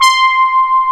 BRASS C5; E-MU Formula 4000 Series Vol. 3; Analog Odyssey; JXBRASS 3

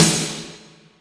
SNARE, M1-M3R; Greytsounds VOL-1

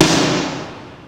Snare, Fairlight CMI III

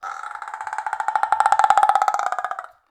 ROAR, Perc Toys; Spectrasonics; Supreme Beats; World Dance

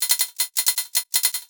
hithat crash cymbal

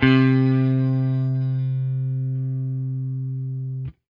FENDER HRD, Guitar & Bass; Quantum Leap

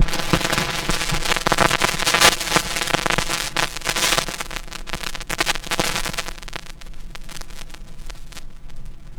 FX SWEEP

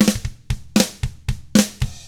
116 ROCK, Double Platinum Drums; ILIO

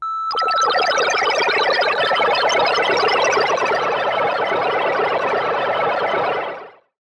Classic FX; radiosonics; Classic Simple OSC FX

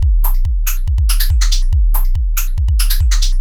Anabeat Mix, Beats

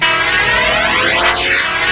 FLANGE; East West; Global Sounds; D50 FXS

